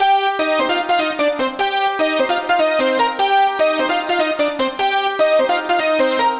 POLY PPG, HOOKS 150; Masterbits - Tekkno Trax

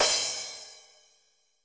cymbal, Roland MC-909

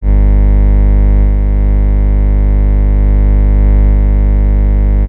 Ground, Industrial

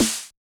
SD66, Yamaha DJX-2B

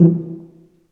TUBA, Tuba stacc; Roland L-CDX-03 Disk 2

